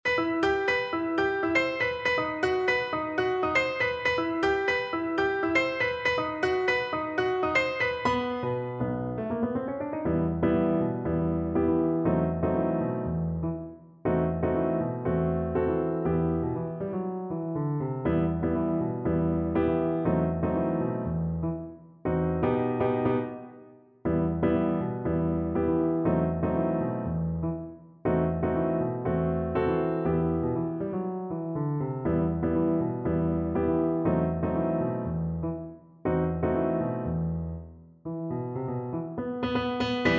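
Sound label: viola karaoke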